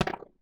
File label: Alien Weapon Shot; Game Weapons; Military; Game Essentials